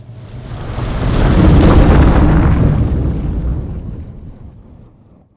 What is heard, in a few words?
Whoosh